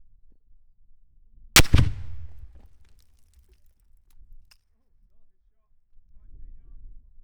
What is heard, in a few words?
L109A1 HE Grenades, 10m; SD702; Brecon 2015; 8040; WEAPONS RECORDING PRIMER